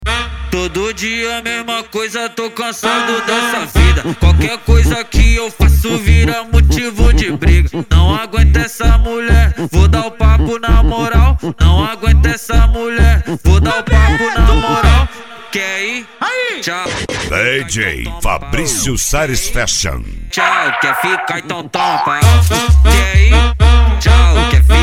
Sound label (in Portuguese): sound car